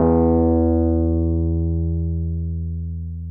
Wurlitz EP E1; Sonic Reality - Vintage Timetraveler

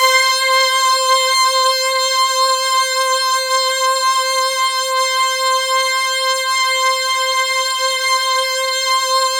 C5, Synth Line; 90 s Sample CDs; NiceBeats; SynthLine VOL-26

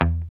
GUI STRAT; Sound & Vision; Gigapack I CD 2

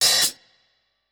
Kit, breakcore Drum Hits

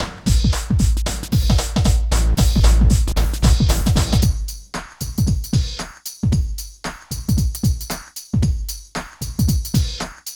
LOOP; East West; Phat & Phunky

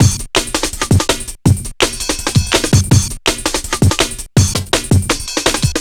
165 short ride, Drum Loops, Drum & Bass; Total Drum&Bass

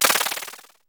GUIRO; DRUMS & PERC

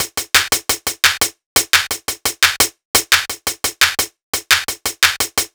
Hi-Hat Clap Combo, Hi-Hat Loops

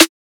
Snare 808; DEFY THE LIE; 808Flex

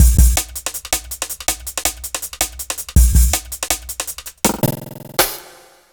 Rolling Break, Drum Loops, Jungle, 162; Jungle Warfare 1&2

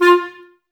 clarinets, Wood Winds

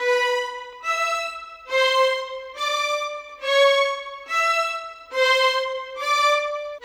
Violin; Sonic Foundry (Sony Creative Software); Orchestral VOL-2

